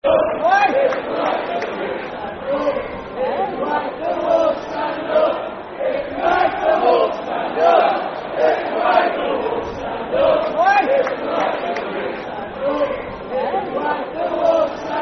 Stand Up If You Hate Millwall Football Chant (Soccer Song); Walsall Wfc; fanchants